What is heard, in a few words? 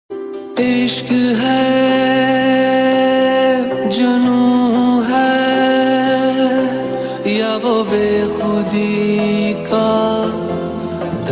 Ost pakistani ringtone; Sang E Mah